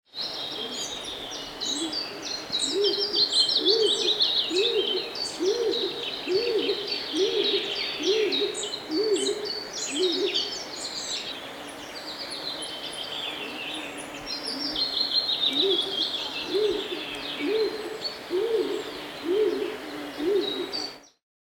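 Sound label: stock dove voice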